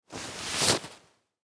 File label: Cloth Smooth Bed Sheet; Foley; Blastwave